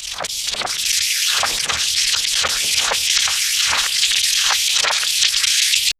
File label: water sound for page slid